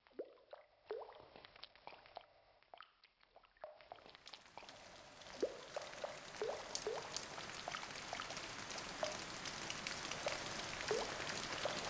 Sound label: Happy Rain